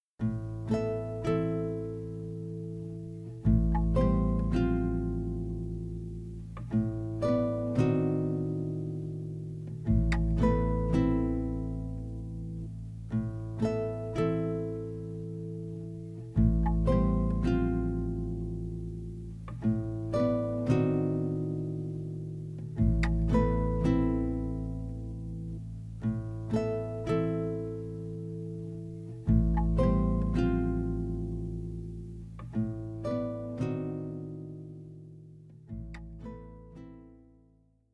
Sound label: loop